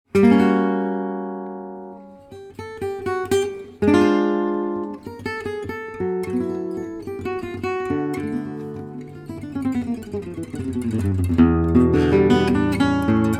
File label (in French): SOLO